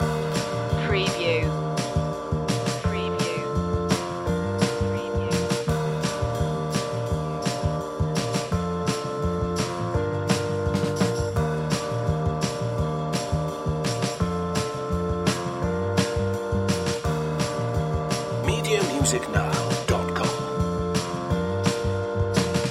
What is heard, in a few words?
summer ride loop